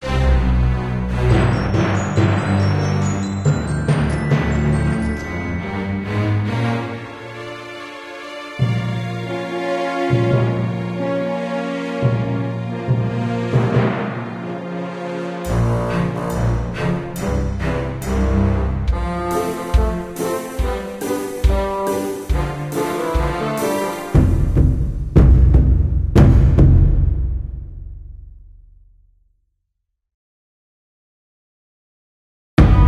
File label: Fall Off Cliff; tales of monkey island